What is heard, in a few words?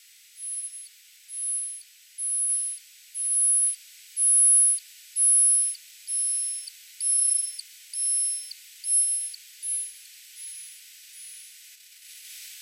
R hipposideros; Correze; M-Barataud